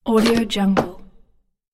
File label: cardboard box